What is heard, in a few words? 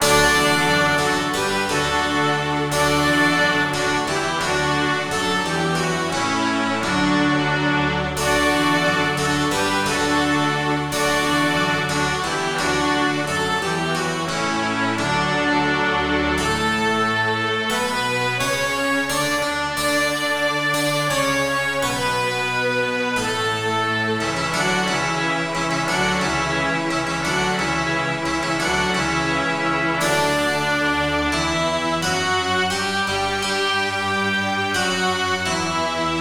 Hallelujah Chorus instrumental Postlude